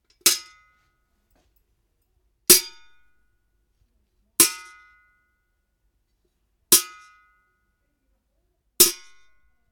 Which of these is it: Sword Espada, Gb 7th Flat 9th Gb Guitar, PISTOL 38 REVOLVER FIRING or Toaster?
Sword Espada